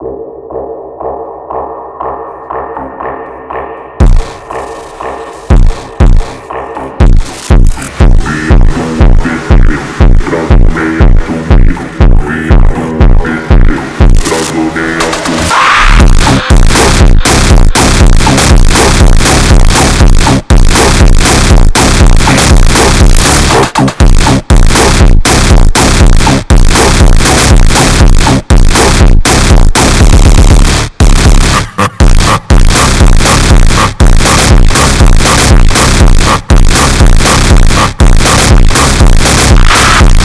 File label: Brazilian Phonk